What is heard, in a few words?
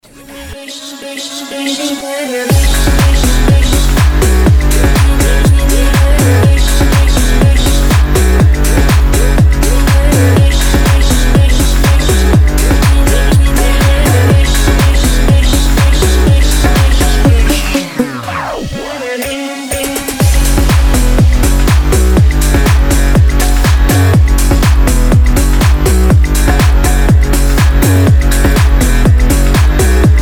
indian phonk